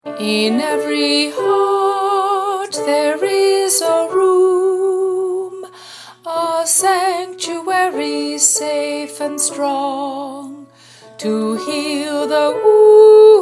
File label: alto audition tracks